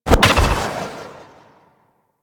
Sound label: Universe Ring End; Warframe; Warframes; Nezha